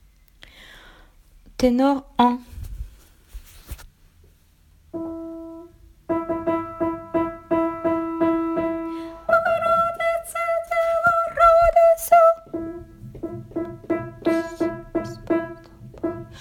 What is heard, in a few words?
tenor; bogoroditce de arvo part